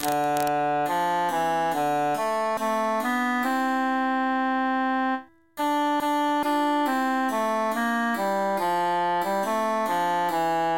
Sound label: altus emphasized; now wolde y fayne